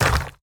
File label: Pound